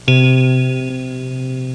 pong, electron; Easy Personal Web Page

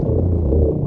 drone; Zaero

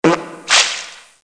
SQUIRT

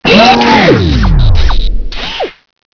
DEATH

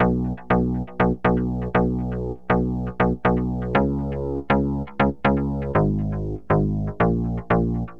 BASIC B, Bass

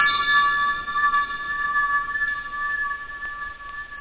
ting; Workbench Add-On - Volume 1; NATURE

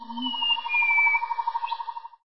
farm; PHARAOH

